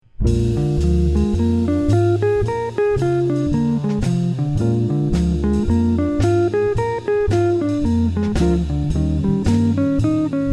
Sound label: Arpeggio Blues, guitar